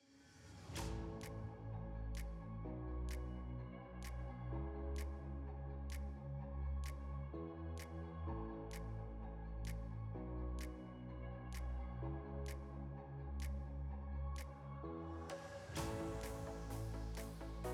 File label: Instrumental